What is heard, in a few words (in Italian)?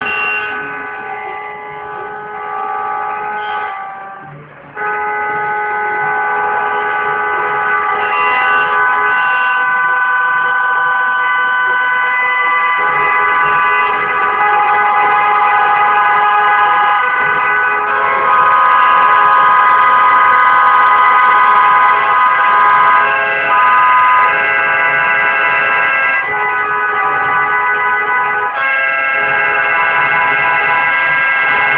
clacson